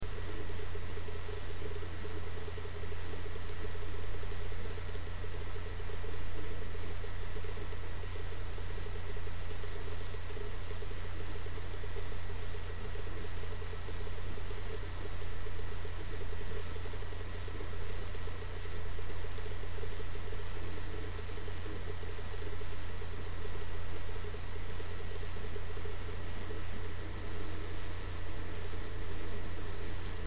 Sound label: samsung small, hd; hardware